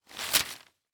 Household Newspaper Turn Page

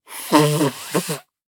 Human Male Nose Blow

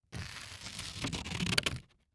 Scissors Cut Paper Slowly, Household

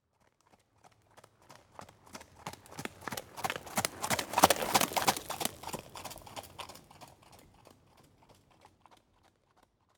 Animal Horse Canters By On Gravel; animals; AdobeCC

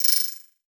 Multimedia Internet CD-Rom Flash Click Multiple

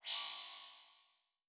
Multimedia Internet CD-Rom Flash Beep Whoosh

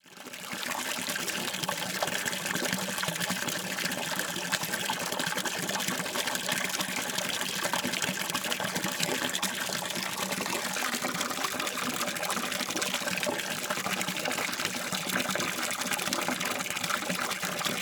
Ambience Water Filling Up Pool; AdobeCC

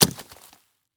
Impact Metal Drop On Leaves fast

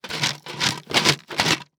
Household Crayons Shake In Metal Container

